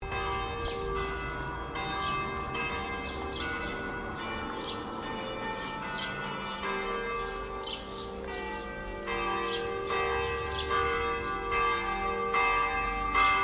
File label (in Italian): ave maria campane